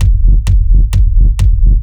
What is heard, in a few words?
kick test linphase high; Kick-Sound design